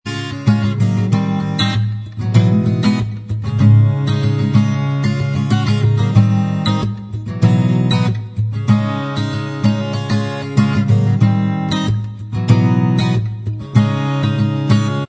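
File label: acoustic, instrumental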